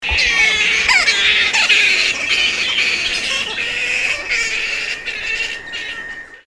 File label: animal black flying fox group call